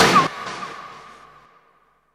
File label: FX Downlifter